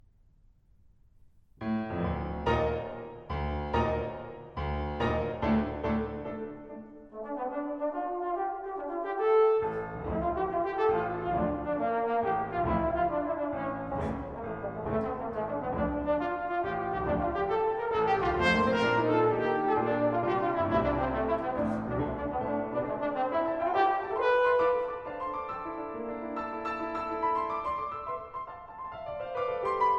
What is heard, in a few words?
Sonata for Trombone & Piano, Dances (Wild and Restrained)